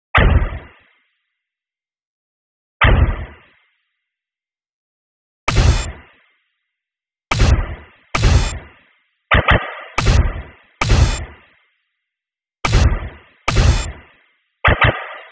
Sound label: kick